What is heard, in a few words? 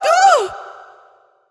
Wound Crit; Julianne